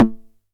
909 SD Tape; Goldbaby Tape808 & Tape909; The Tape 909

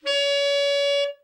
tenor soft, sax; Giga Samples Collection

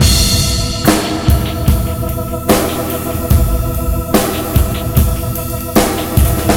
DRM, Breakbeat; DOPE DANCE 073

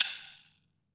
wood shaker; AKAI S-Series CD-ROM Sound Library VOL-5

